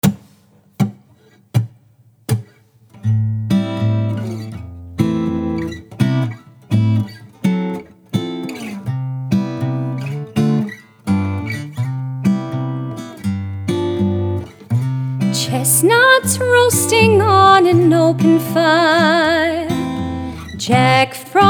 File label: Cover Female